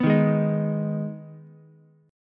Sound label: D sharp Suspended 4th Sharp 5th, Ukulele; strummed; JGuitar